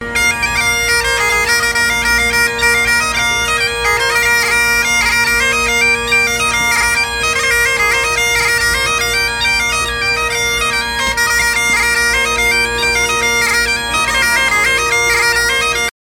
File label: A Soldier Playing Bagpipes In Edinborough